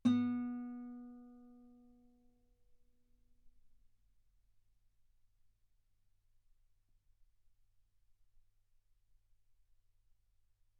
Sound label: Guitar B3; ff; sul B